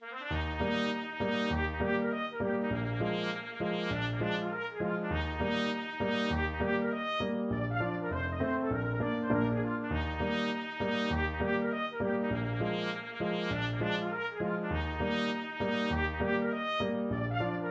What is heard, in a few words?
trumpet hornpipe